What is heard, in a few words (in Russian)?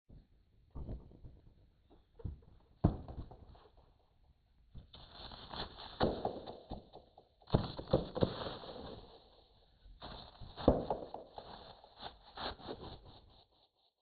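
стук о твердую поверхность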